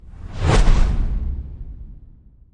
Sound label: flip animation; Hot Spin Deluxe